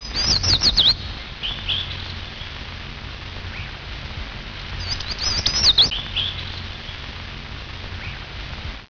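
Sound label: forest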